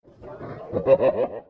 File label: evil laugh short